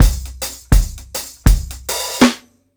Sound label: 82 DRUNK FILL, DRUMS LOOPS; BASEMENT DRUMS VOL 2